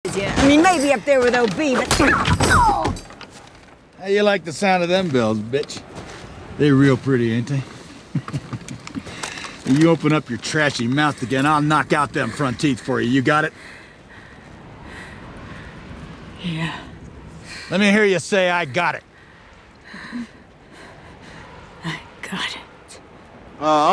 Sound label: bells; Hateful Eight